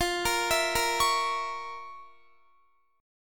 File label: F Major 7th Suspended 4th Sharp 5th F, Guitar